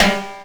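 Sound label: timbale hit; Sounds Good - Roots Reggae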